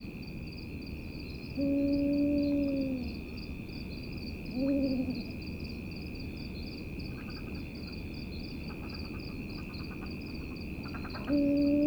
Arabian Eagle Owl Hooting Of Presumed Male Then Chorus Of Dhofar Toads